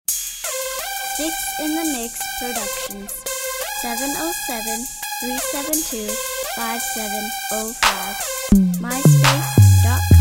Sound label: gangsta rock